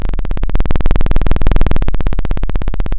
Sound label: Sound of a chainsaw